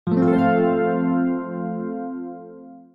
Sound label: arpeggio